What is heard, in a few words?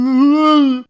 whale noise